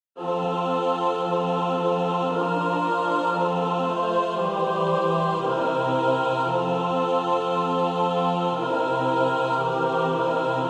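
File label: mixed, practice music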